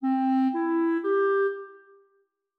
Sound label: 5 notes F min